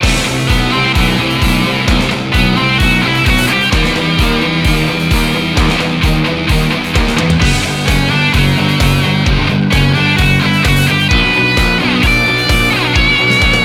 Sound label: BGM Battle; Kenka Banchou 6 Soul Blood; 3DS